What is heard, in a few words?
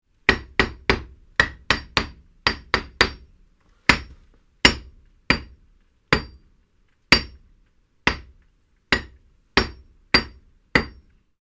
Hammer hitting a wall hammering